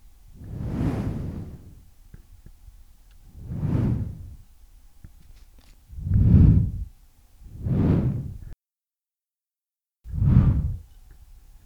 Blow

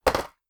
Plunk

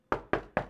Knocking On Wood Door

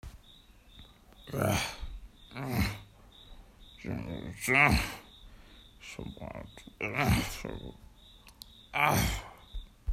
Male Grunting

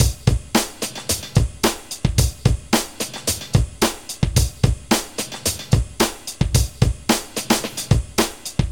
110 Bpm Breakbeat

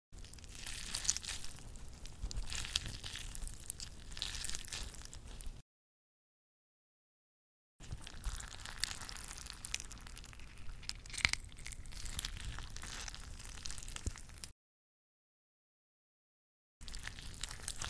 Squish Sounds

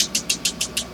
Bicycle Wheel Hexagonal Rotation

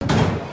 Metal Hit

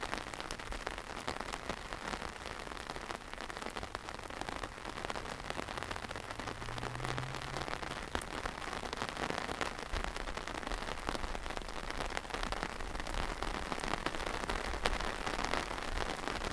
Rain Falling On Umbrella

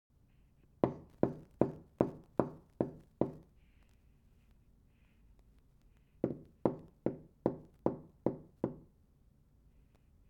Hammering The Wall Gently

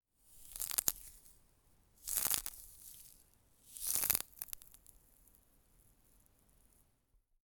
Pinch Fold Foam

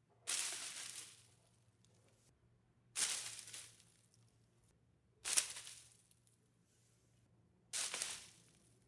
Rock Hit Bushes Brush Land In Leaves

